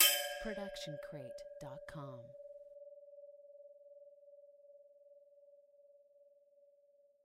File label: Metal Hit; SoundsCrate